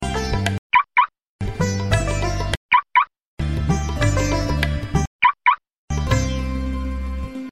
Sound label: Car Lock; Nokia